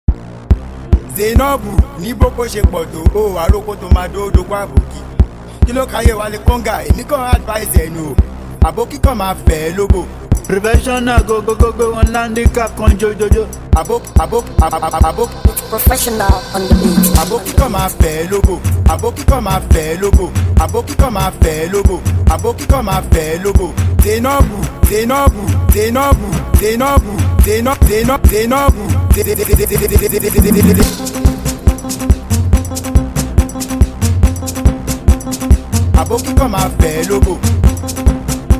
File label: Conga Beat; Walley Conga